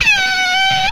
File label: Skid, retro arcade; MMRetroArcadeSoundsPack; Vehicles